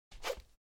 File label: swish sound modal open